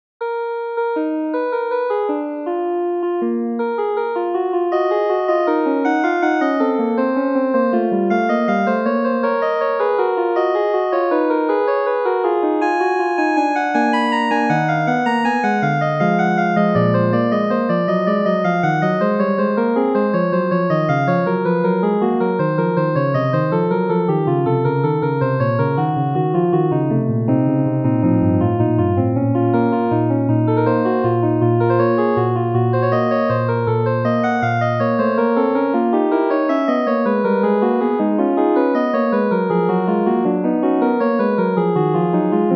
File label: prelude pythagorean